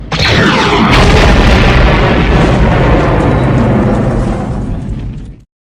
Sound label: cannons